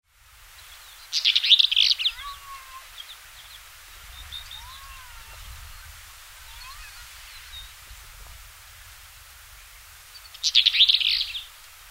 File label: black eared wheatear